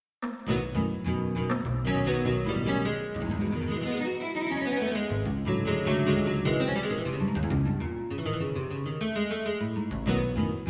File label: Tango En Skai nylon mix